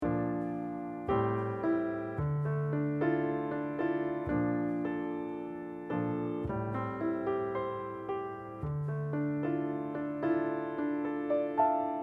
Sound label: accomp